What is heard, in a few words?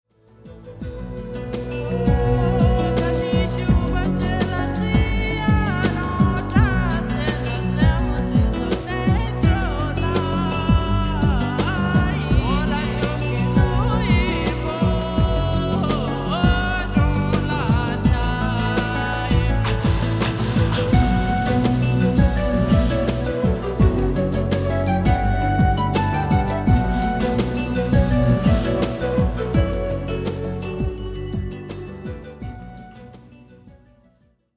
Epic